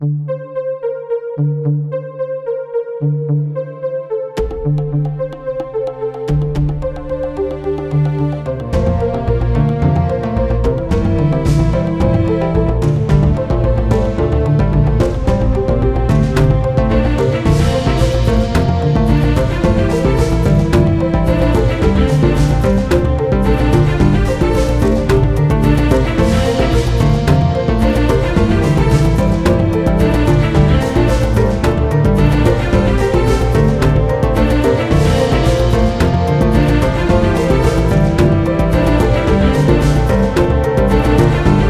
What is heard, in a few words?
PUZZLE SOLVED; escape academy; Introductions